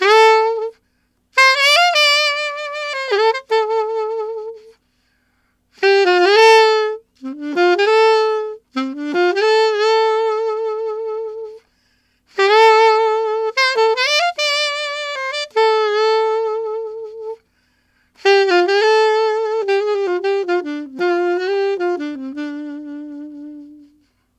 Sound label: Alto Rock Ballad in D min, Sax; Sonic Foundry (Sony Creative Software)